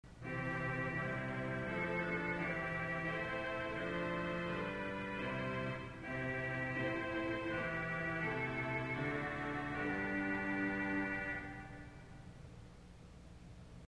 Vox Humana Tremolo, organ stops; Culver Academy

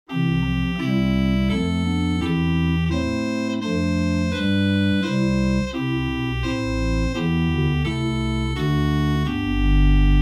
SubBass Krumm Holz, organ; Buzard Opus 3

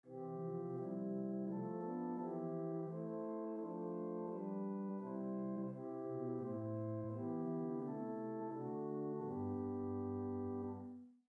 Dulciana 8, organ; St Annes Moseley

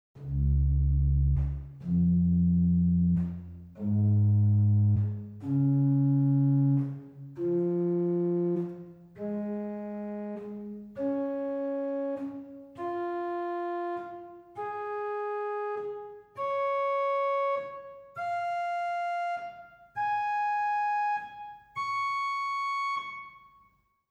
Principal 8 arp, organ stops; Reinhardtsgrimma

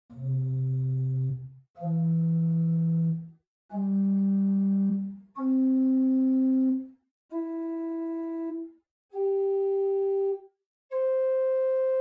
Spitzflote 4, organ; Buzard Opus 3

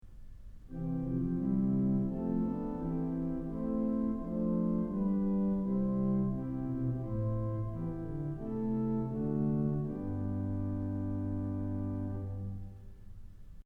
Diapason; Kellogg Auditorium

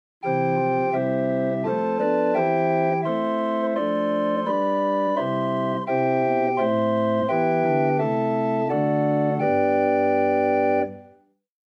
Bw Gedackt Rohrflote; Grace Lutheran Champaign

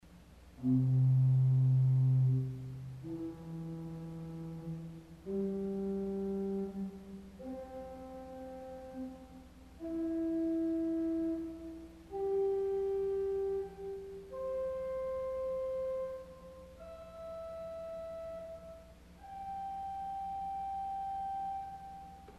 Ped Nachthorn 4 Arpeg, organ stops; CulverAcademy

